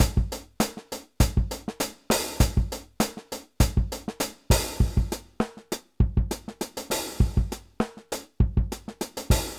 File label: Wave Drum MIX